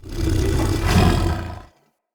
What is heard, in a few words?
Corrupted Battle Dog Attack Breathe Snarl Active Idle; Animals; Vocals